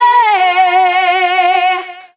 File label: meeeee, Voice